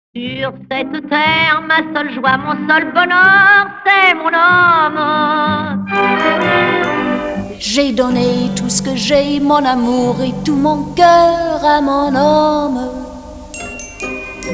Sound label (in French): Chorale